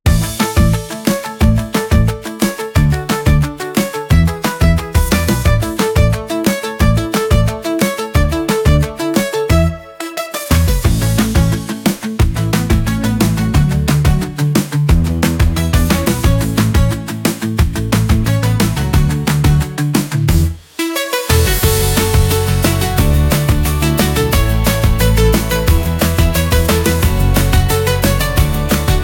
89 bpm Guitar Pop Rhythm; 45 sec; ai social media music

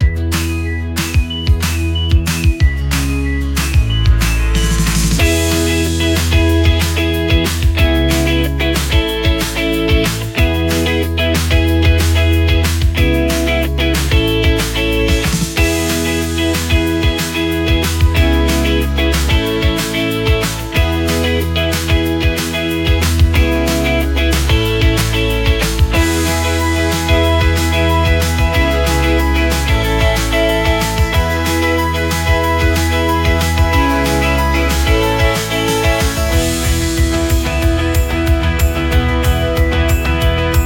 92 bpm Glass Guitar Synth Rhythm; ai social media music

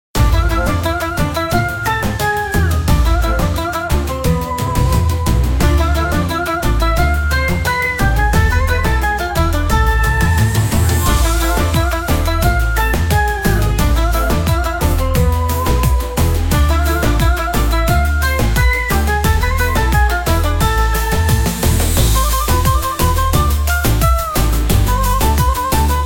Oriental Belly Dance; ai social media music

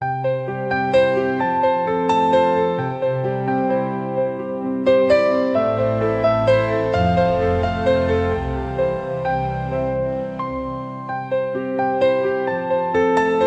Dancing Piano Keys; instrumental; instrumental ai music

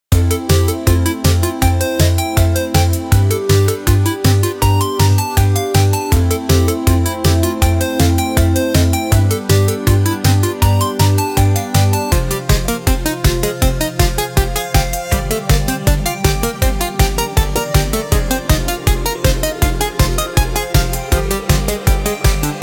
16 Bit Transformer 80s Beat; ai social media music

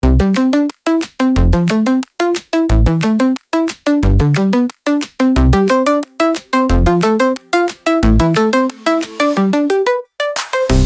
90 bpm Happy Synth Bass, instrumental; 54 sec; ai social media music

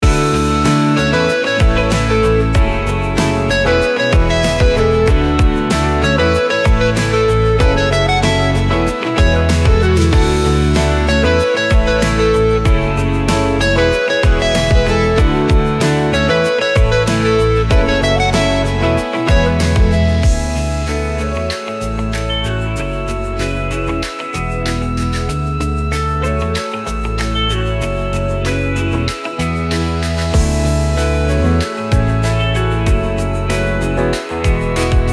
95 bpm Guitar Synth Drums, instrumental; 88 sec; ai social media music

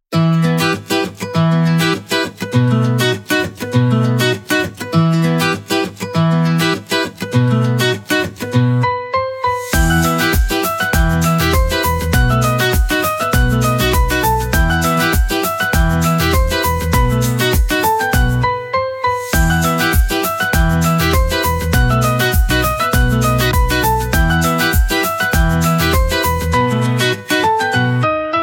100 bpm Spanish Rhythm Guitar Piano, instrumental; 31 sec; ai social media music

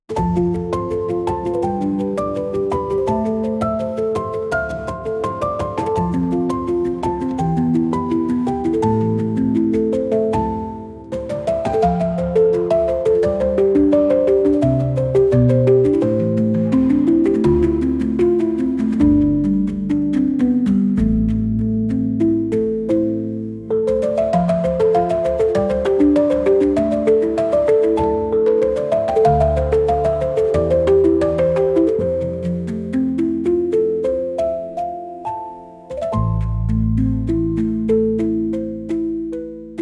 80 bpm Handpan Silence Beauty; 49 sec; ai social media music

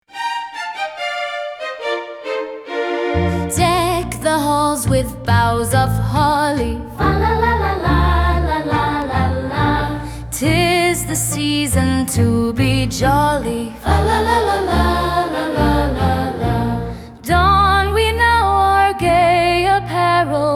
Deck the Halls; weihnachtslieder alt; weihnachtslieder gema frei

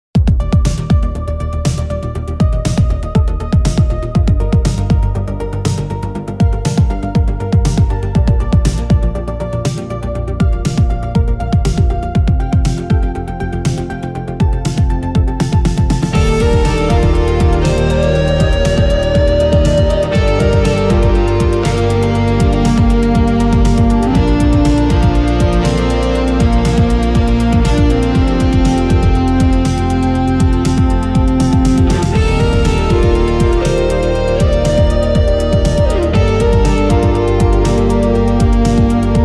Bending Guitar Melody; ai social media music

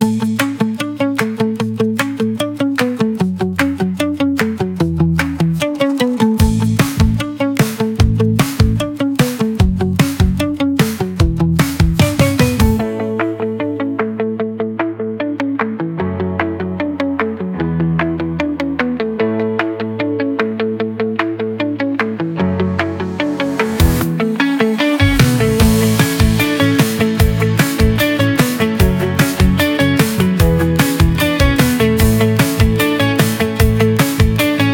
150 bpm Guitar Synth Arpeggio; 54 sec; ai social media music

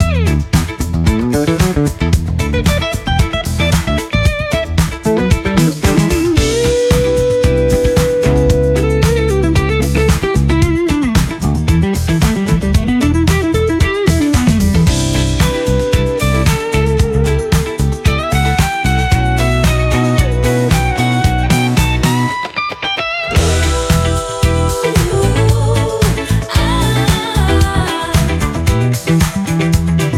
Funky uuuu aaaa; ai social media music

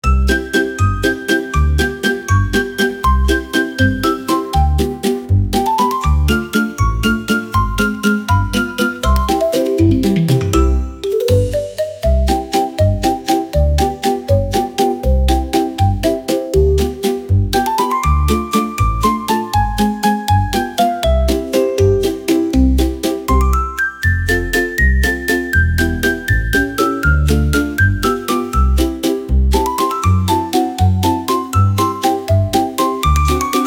120 bpm Marimba Winter Waltz, instrumental; 61 sec; ai social media music

